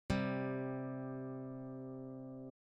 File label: intervals harmonic perfect fifth, guitar; Standard Notation